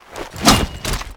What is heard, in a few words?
Knife Attack; cstrike; AK47-Beast